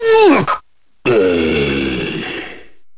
pain; svencoop; ub megaman

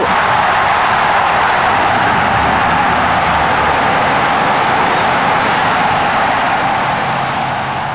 goal, football; tfc

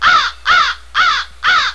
crow; tfc

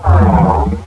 shock trooper pain; cryocell

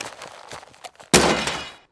law discard, weapons; cstrike

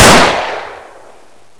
glock fire; svencoop

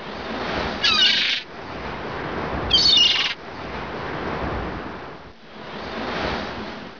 seagull waves; cstrike

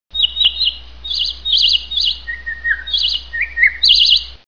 ww birds, ambience

